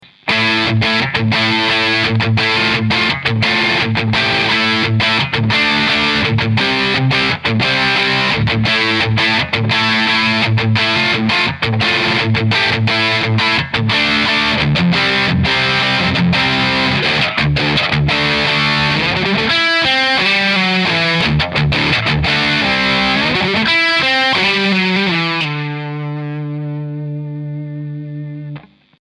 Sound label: Heavy strat